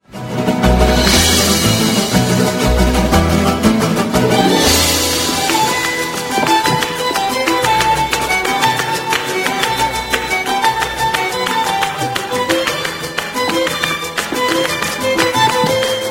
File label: spanish tango